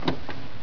vehicle door open; Project Zomboid v41.73

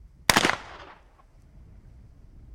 assault rifle distant; Project Zomboid v41.73

